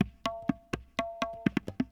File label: PERC LOOP ETHNO 125